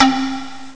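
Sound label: flute hi; Amiga Soundtracker Sample Packs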